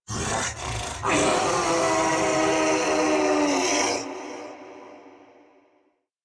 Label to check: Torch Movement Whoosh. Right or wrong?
Wrong